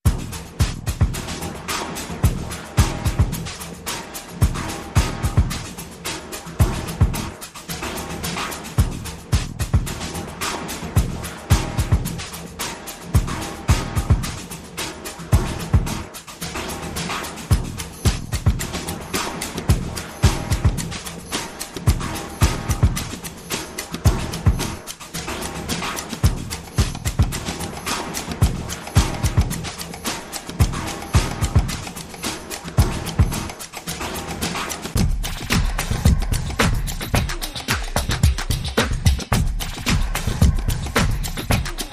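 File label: Percussion Only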